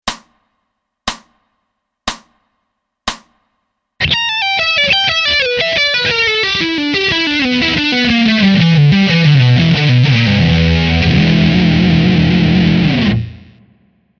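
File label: Blazing Blues Pent slow rock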